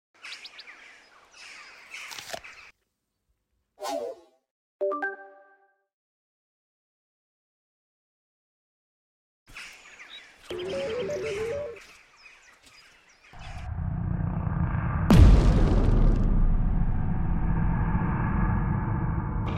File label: Spanish SFX; Escape to Freedom